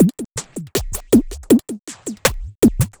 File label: Beat, Drums, Eclectic Electronica